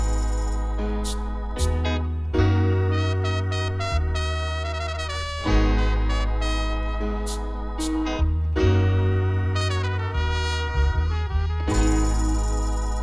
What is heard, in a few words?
horns